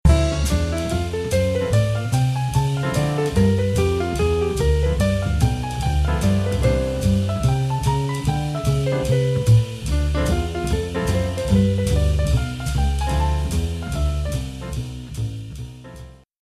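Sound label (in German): Piano mit Playback; Jazz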